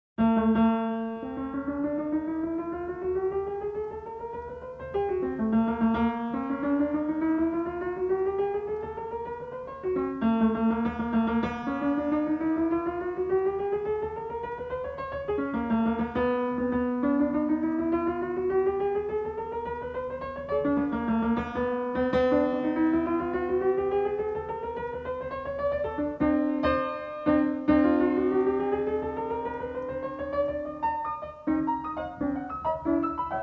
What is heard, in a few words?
Suite for Quarter-tone Piano; Alois Haba; No. 6, Op. 88